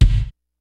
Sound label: FUNK, DRUMS; Kid Nepro; Elite